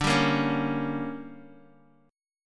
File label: D Major 7th Suspended 4th Sharp 5th, Guitar Half Step Down; DM7sus4#5